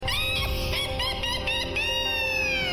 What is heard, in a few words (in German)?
kichern; fasnachts-kalender